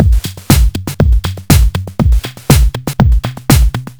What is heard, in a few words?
120 Drums; Zero-G; Soundclash Nu Dance Electro; Acidized Wavs; Combi Loops; 120bpm